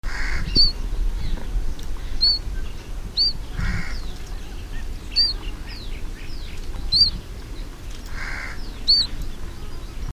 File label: call india, tristis